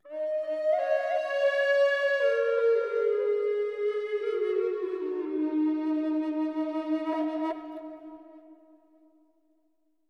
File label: Echo Flute; Flutes